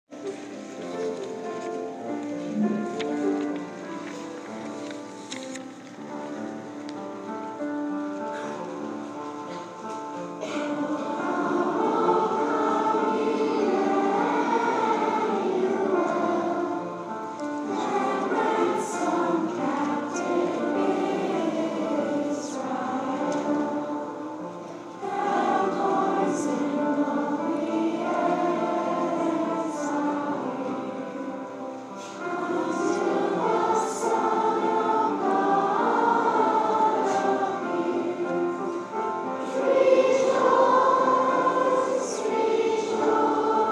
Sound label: o come o come emmanuel sung at advent prayer service